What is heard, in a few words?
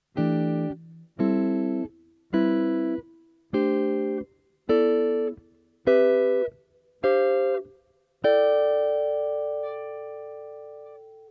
chord melody guitar